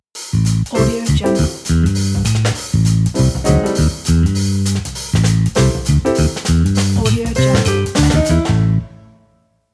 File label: jazz groove intro